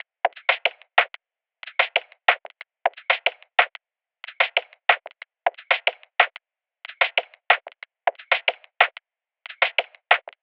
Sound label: 92, loop drums percussion lofi